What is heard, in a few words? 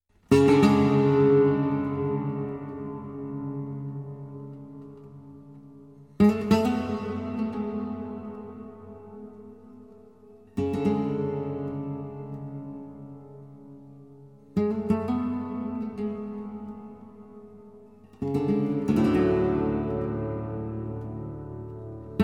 Rondena, flamenco; spanish guitar